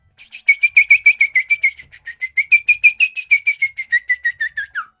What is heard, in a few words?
Folk Whistle Birdy